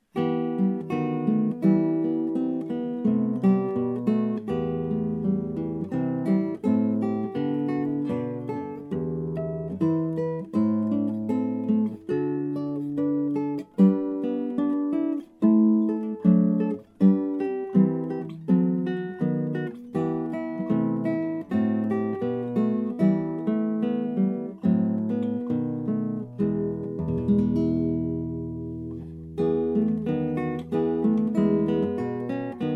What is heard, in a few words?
guit